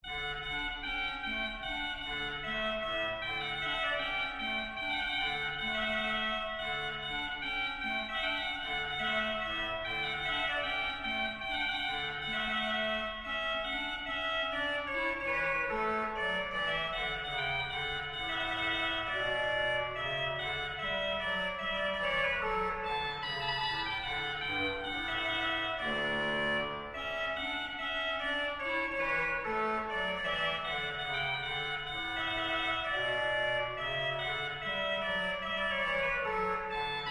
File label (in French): cromorne